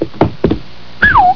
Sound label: whistle